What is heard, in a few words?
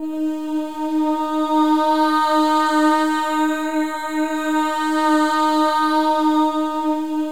MOVING V4, Symphony of Voices; Spectrasonics